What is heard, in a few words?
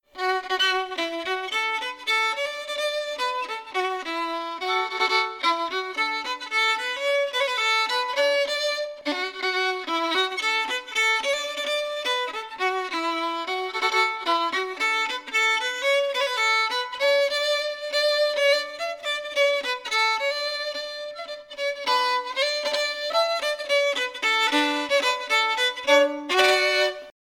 fiddle; finnegans wake